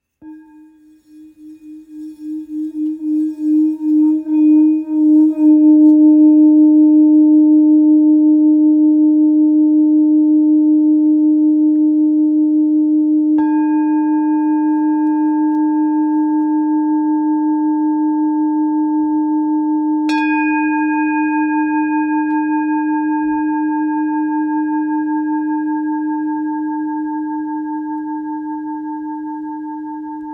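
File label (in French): Bol full moon ré dièse 1.3kg, Bol chantant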